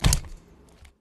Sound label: melee; EMXArms